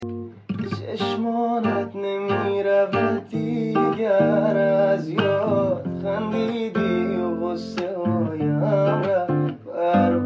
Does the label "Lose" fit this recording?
No